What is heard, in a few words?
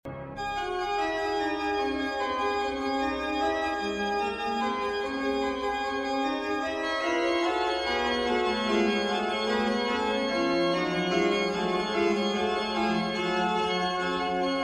Toccata & Fugue in D Minor, Fugue; BWV 565